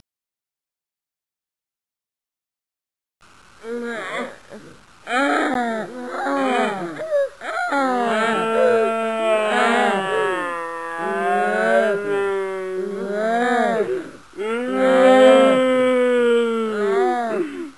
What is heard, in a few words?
cry; svencoop; saker